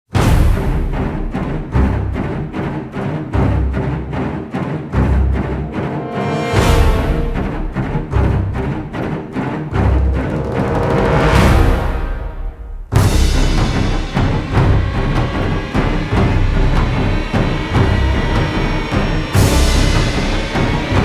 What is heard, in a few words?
no choir